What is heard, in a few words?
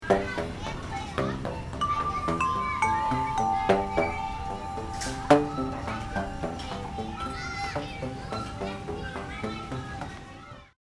pipes, sounds created by Sonic Architecture’s interactiv; Bill and Mary Buchen